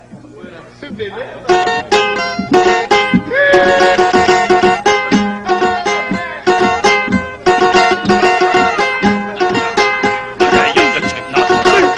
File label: capoeira start, SAMBA